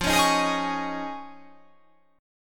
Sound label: F Minor 7th Sharp 5th